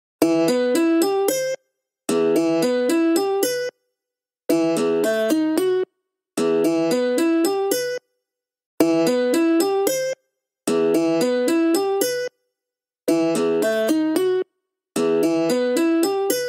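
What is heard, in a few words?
Instrumental Guitar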